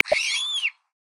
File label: Superb Starling; Xiaomi Redmi-Note-8-Pro; Africa